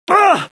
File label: hit; BattleSNDS